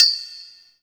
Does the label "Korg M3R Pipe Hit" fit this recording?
Yes